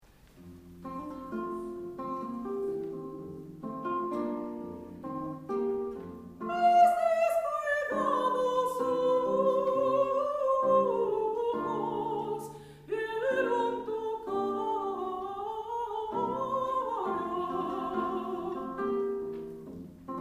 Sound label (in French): Chant Espagnol